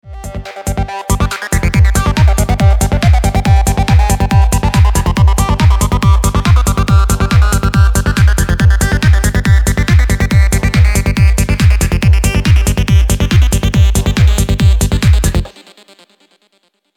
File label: Lead Context